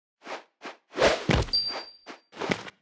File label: Ultimate Goblin, 动作音效; 哥布林; 绿野世界; 部落冲突; 皇室战争; 在广场点击初级、经典、高手、顶尖和终极形态或者查看其技能时触发动作的音效